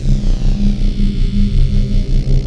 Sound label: Teleport Beam Loop; SFX Kit; Sci-Fi Loops